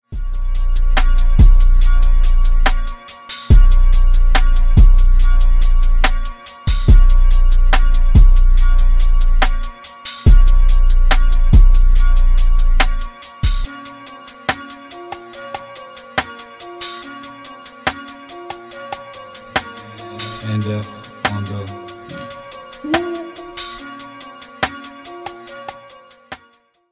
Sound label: smoke ringtone; english ringtones